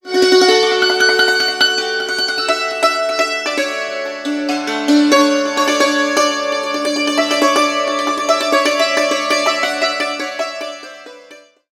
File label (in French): Son Santur